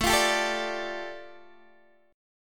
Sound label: A 7th Suspended 4th Sharp 5th, A, down, Guitar, Open D